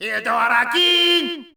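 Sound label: yell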